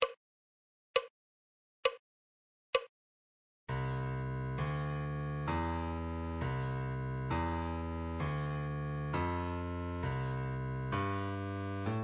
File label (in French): Clé de Fa Adagio